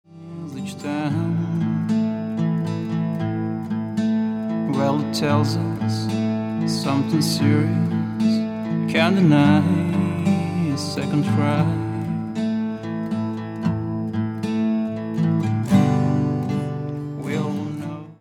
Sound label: Blues